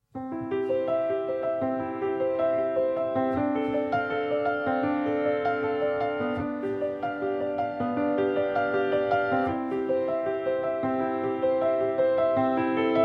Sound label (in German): Praeludium Nr 1 J S Bach